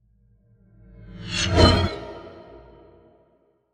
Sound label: Banshee